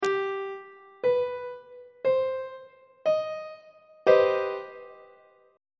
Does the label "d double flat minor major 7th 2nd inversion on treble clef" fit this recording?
Yes